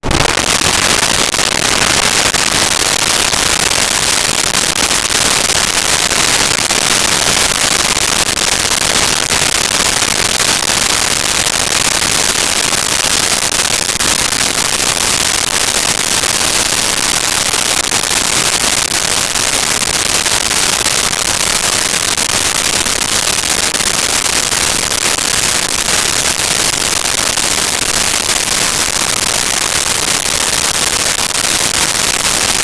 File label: Tires On Gravel Steady; Vehicles; Cars & Trucks